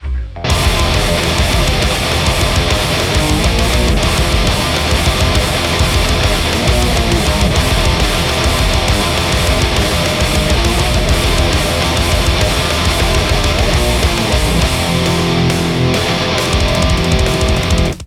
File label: metal